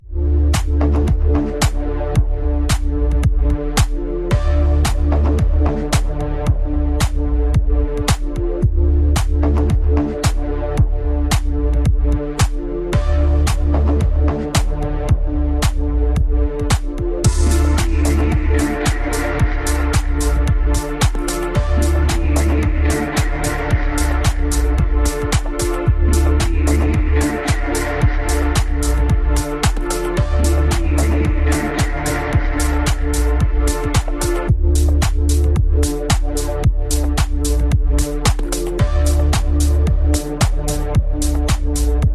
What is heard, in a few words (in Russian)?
muzyka v mashinu bass boosted